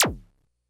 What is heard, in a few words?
Bleep A, Drum Machines, Korg DS-10